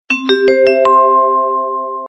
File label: GoingUp message